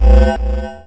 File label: spooky jungle bass